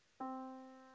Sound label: Yamaha Disk, piano sound